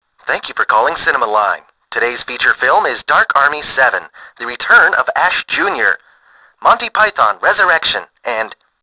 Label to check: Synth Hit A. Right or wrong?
Wrong